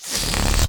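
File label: Balloon deflate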